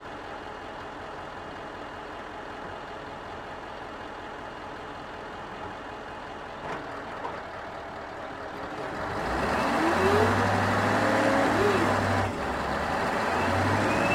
Stridsfordon 90 - ONBRD - SLOW - Drive Various - ENGINE - Intake; Pole Position Production; Combat Vehicle 90; DPA4062